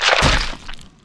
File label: crag turtle dead, monster